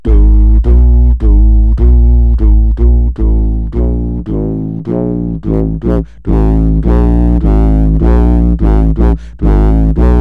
Voice Uni Syn moduliert

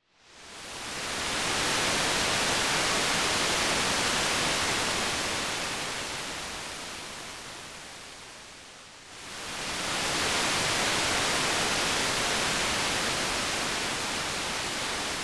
Flanger gefiltertes Rauschen

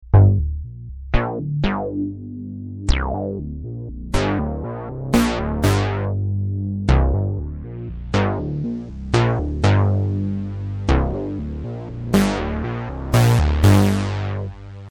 bass sequenz

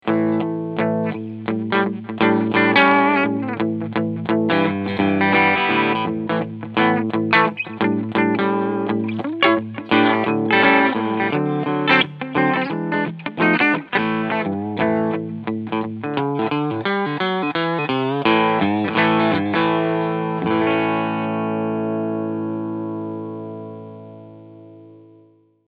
Epiphone LP Cleansound Lick; Jared Nichols Old Glory

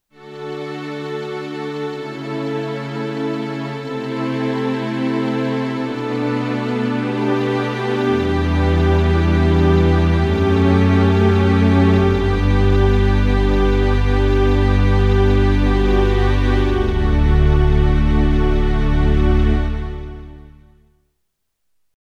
Roland FP30 Streicher

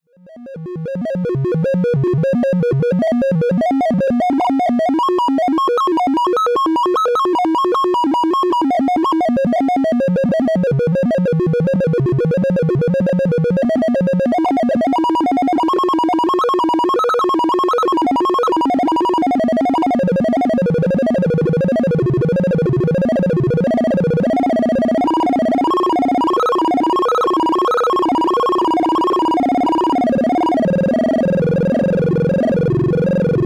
Perzeptionsexperiment Übergang von LFO in OSC

